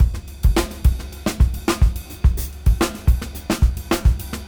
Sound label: Junky Funk 107 BPM; AMG - Keith LeBlanc Phat Butt Jams